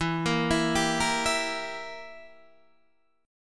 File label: E Diminished E